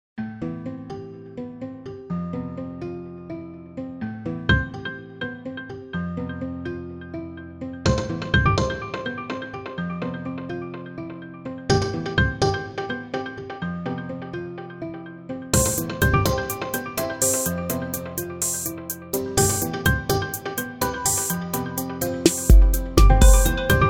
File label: pianoteq